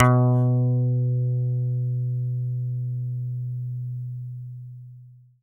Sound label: E-GITARRE, Alpine Volksmusik; Best Service